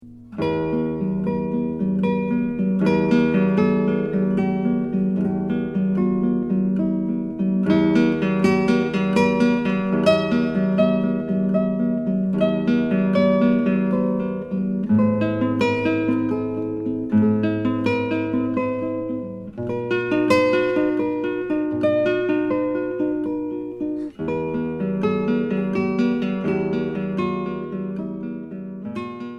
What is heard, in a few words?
Classical; Dana Starkell